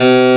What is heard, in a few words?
Harpsichord Eb1